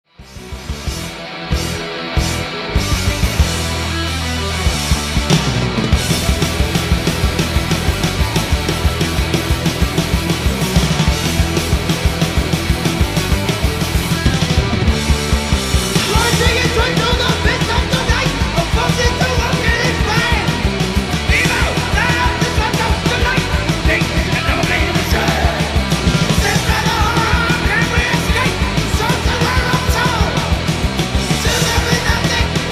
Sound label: speed metal